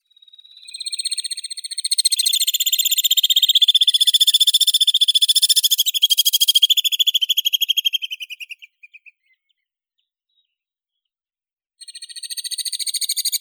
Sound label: strizh belobruhii apus melba